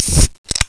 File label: open; Desktop Themes; Jolt Cola